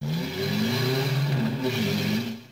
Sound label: Motorbike; RedDot